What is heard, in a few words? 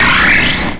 cry; source of the sovereign